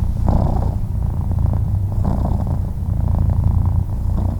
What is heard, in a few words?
purr; CosmicRage; socials